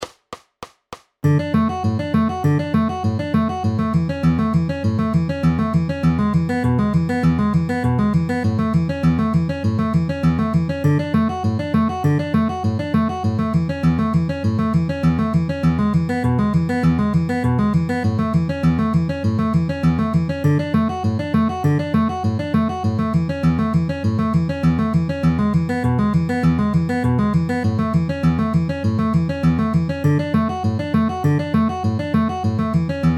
D A E A inner outer middle middle with alternating bass at 200 bpm